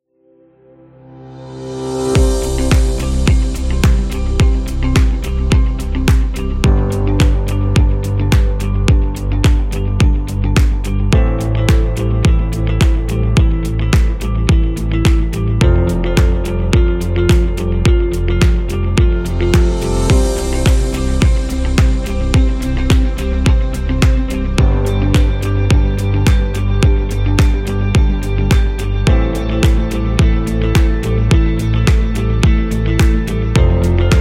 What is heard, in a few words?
The Corporate